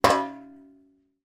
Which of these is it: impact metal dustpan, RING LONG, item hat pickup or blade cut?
impact metal dustpan